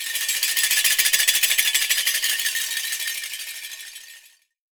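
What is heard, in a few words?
AFRICAN TIN, TIN RATTLES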